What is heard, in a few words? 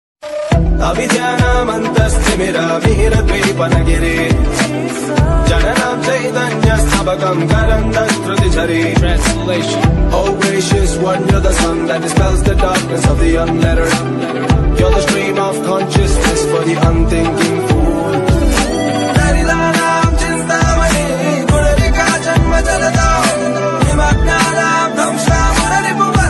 Gods Ringtone Mahadev Ringtone